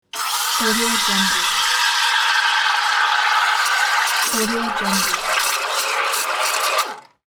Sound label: espresso machine steam noise; robertbushmanfx